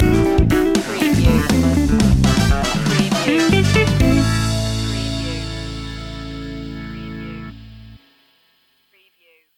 funk soul king stinger

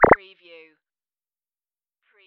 future

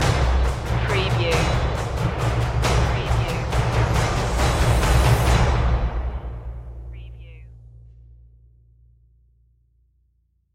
perc stinger

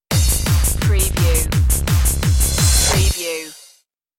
bubble gum beats stinger

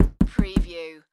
Door Knock Fist

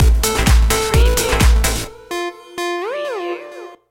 dance stinger

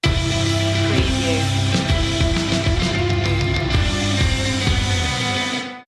slow stinger

